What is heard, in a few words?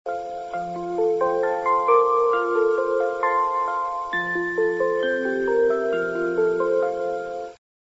chime; keio; ttc